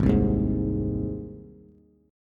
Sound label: Ab Major, Bass; strummed; 4,3,1,1; JGuitar